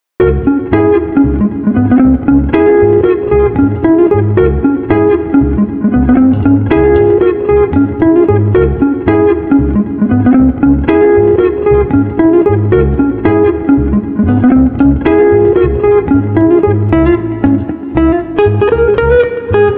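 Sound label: Street Guitar Combo Lively no Drums, 115 bpm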